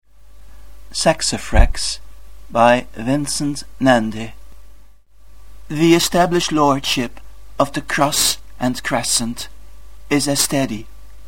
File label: Sax